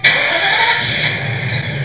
CAR

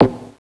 clag

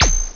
chirp

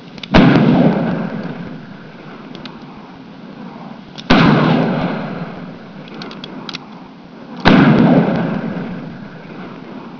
shot

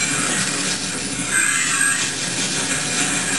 Running; Jungle Escape

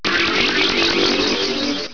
morph; robossy

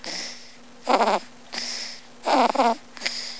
BABY SNOR; PEOPLE

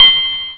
collect

